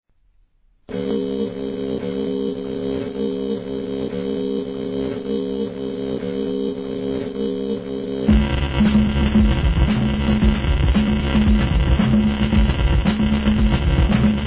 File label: remix